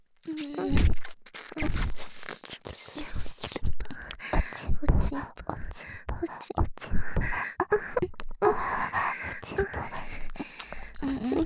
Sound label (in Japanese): 耳舐め喘ぎ; 凪彩と文の淫語ヘヴン